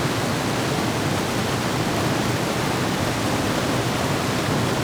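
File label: waterfall; portland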